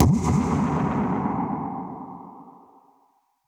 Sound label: Perc Hit, dub percussion